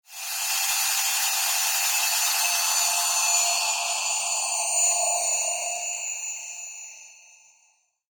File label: Science Fiction Teleport Materialize; Tech